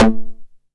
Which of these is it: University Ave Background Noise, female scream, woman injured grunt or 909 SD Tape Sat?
909 SD Tape Sat